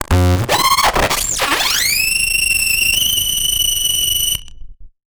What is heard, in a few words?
Casio, Glitched, malfunctioning synth